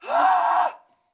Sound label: Scream